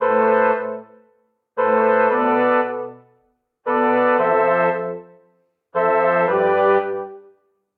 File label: Progression Robust i-ii7-VImaj7-VII-i-VII-VImaj7-v7 A Minor 115 bpm, Brass Quartet; MIDI Clips; Ableton; Spitfire Audio